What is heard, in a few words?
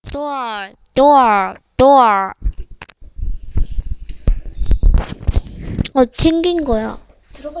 door